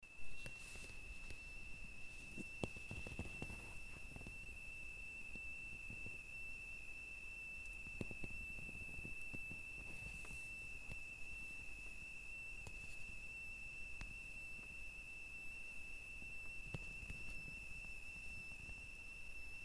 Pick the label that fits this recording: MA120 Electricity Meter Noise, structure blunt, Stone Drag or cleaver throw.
MA120 Electricity Meter Noise